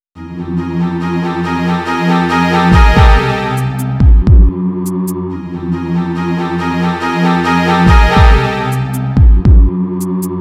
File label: Fright; Clock Tower 20th Anniversary Sound Collection